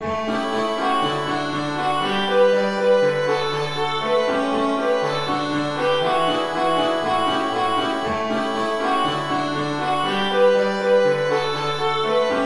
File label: violin